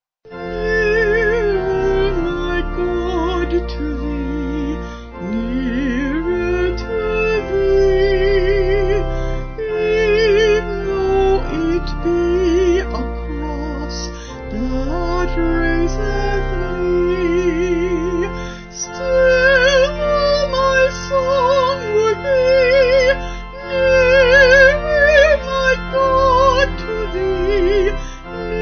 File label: Nearer My God Bethany Vocal Organ